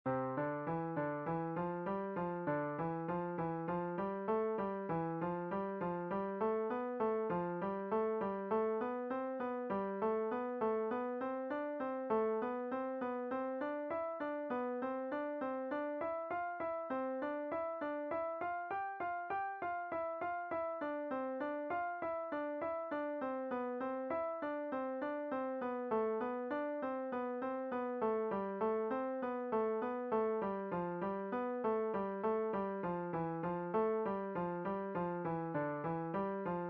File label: Five finger step, piano